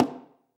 CON, ST CONGA; AKAI S-Series CD-ROM Sound Library VOL-5

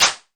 SYNCLAP; CLAPS+SNAPS

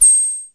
PER, TECHNO PERCS; Crypton - DrumBase5000

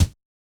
SD, Yamaha DJX 2B

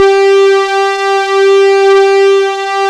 pulse mod G4; Big Fish Audio; Synth City

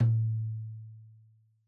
Tom2 Stereo, Deeply Sampled Drums

